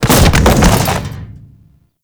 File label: Grineer Fall, Body Falls